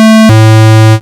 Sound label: error; simongame